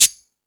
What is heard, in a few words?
Rock N Roll, Drum Machines